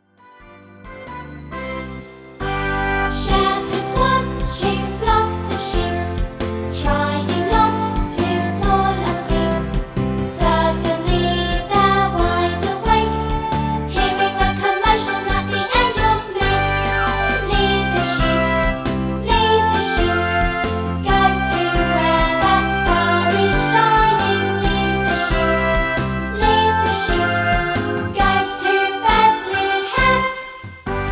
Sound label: Leave The Sheep with vocals